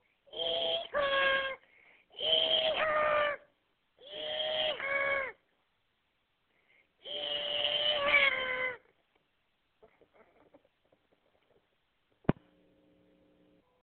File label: heehaw